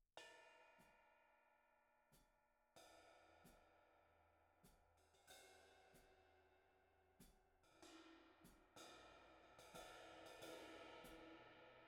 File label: Kick Out, 94bpm